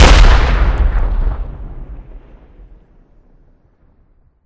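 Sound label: small close explosion; speakerman titan v2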